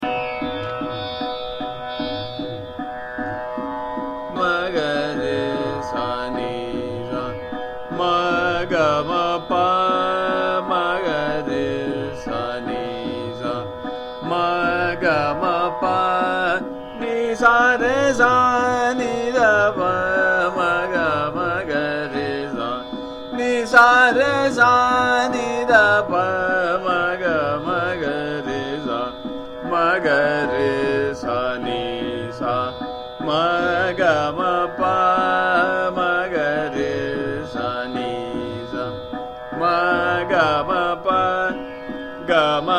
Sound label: Sargam Ma Ga Re Sa Ni Sa Trital, Madhuvanti